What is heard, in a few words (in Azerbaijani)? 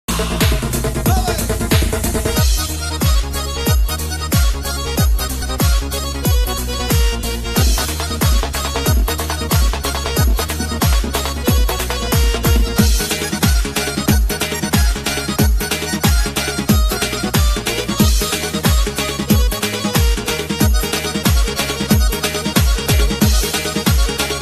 lezginka reqsi; naxcivan